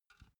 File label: filled can pick up soft